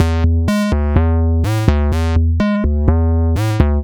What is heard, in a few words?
Slo Bass; Sonic Foundry (Sony Creative Software); Underground UK House & Electro